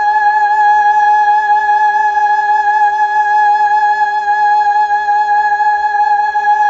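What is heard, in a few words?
Fem G sharp 3, Mellotron Female Choir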